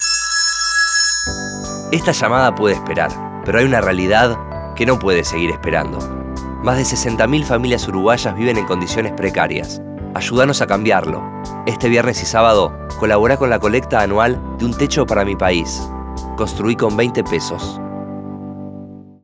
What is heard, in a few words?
ringtone